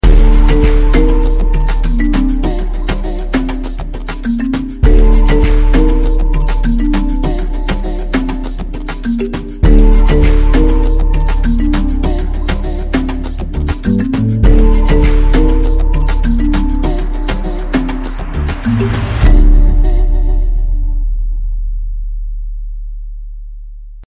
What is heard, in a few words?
Seaside Spectacle; シグネチャー トラックス